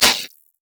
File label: voidling effort; LoL; alzahar